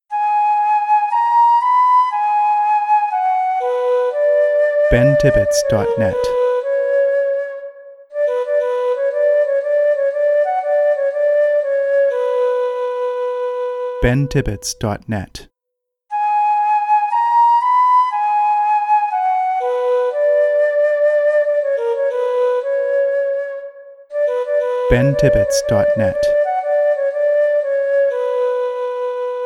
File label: Magical Breeze flute only